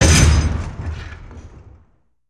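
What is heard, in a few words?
Generator Hit; ambience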